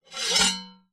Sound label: sword slow scrape on metal; zapsplat